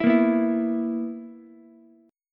B Major 7th Suspended 4th B down Ukulele; BM7sus4; strummed

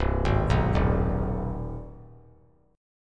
C Minor Major 7th Sharp 5th chord; CmM7#5 arpeggiated; JGuitar

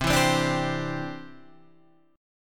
C Major Add 9th, Guitar, Standard; strummed; JGuitar

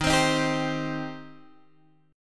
Eb 6th Eb down Guitar Open G

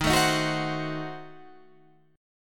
Eb Diminished 7th Eb down Guitar Standard; Ebdim7 strummed; JGuitar

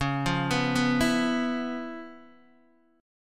Db 7th Sharp 9th, Guitar, DADGAD; arpeggiated; x,4,3,4,2,2; JGuitar

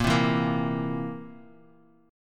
A sharp Suspended 2nd Flat 5th A sharp down

